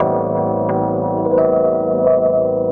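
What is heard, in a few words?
KEYS loop R, Drum & Bass 175 BPM; Ueberschall; Drum & Bass Flash Trackz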